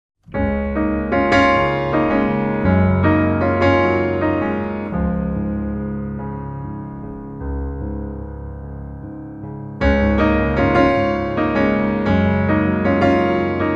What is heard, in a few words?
Piano